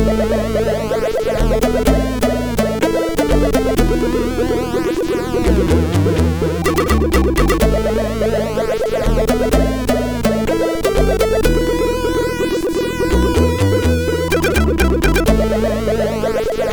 Short Loop, MOS6581R4

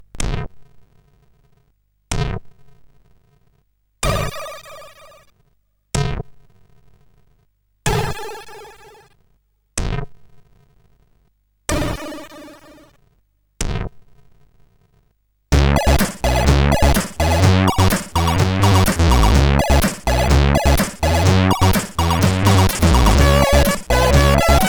Hemsk, sid CSG8580R5; The Dungeon Master

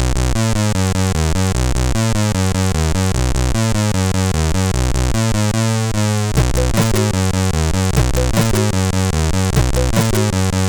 Game music, sid CSG8580R5

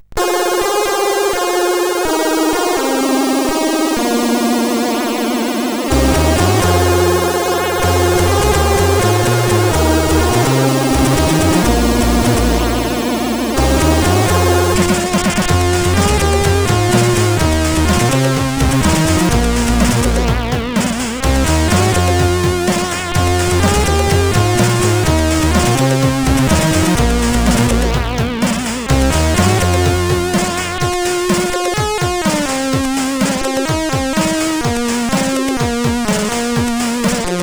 CSG8580

